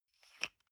toothpaste screw cup open soft single

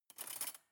cutlery cake fork drawer place soft

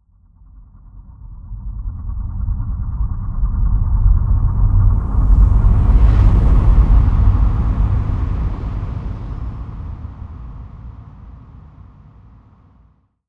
flutter rolling thunder

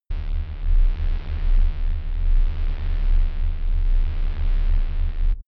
space alien machinery steady

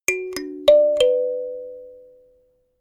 notification kalimba sequence minor

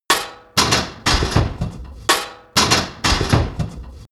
industry machine mechanic metal complex

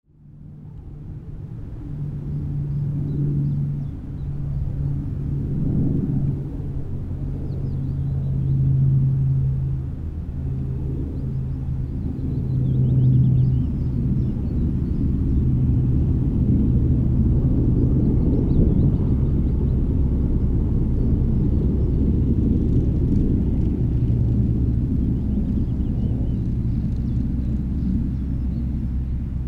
glider drome

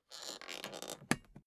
suitcase close latch lock slow

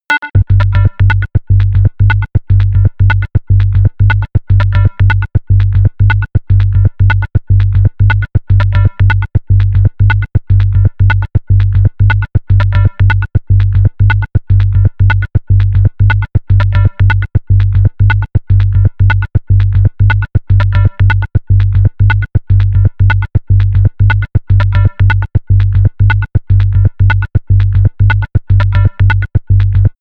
level music

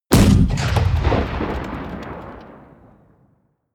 artillery heavy close

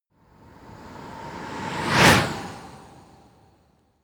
whoosh bicycle hard

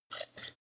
scissors cut paper

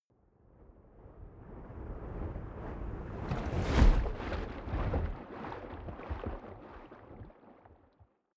waves splash big

